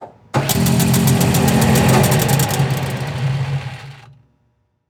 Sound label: Industry Tool Saw Band Saw Turn On Turn Off Short